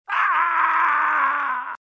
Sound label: Person Grunting in Pain